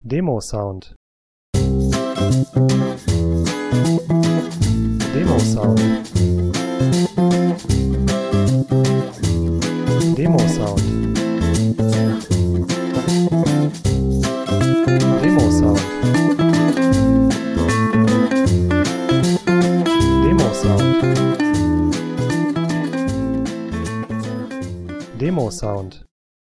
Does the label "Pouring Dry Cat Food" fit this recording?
No